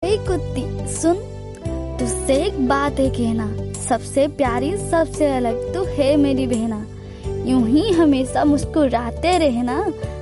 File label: happy birthday hindi